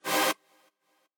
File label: chord